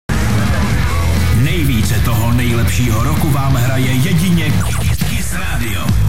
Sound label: rock